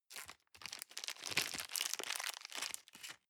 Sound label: plastic bag small rustle long